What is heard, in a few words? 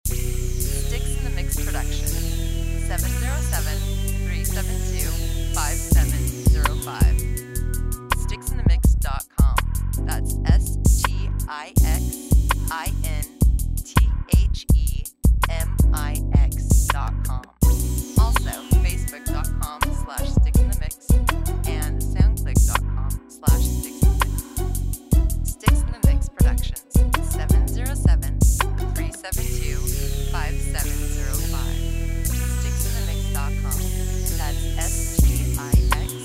haunted clap